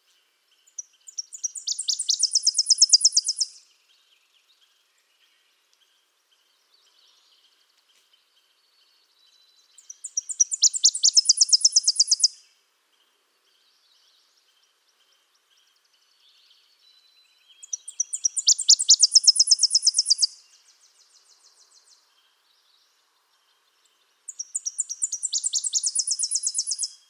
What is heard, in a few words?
Tennessee Warbler Leiothlypis peregrina